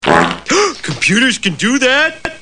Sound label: Computer fart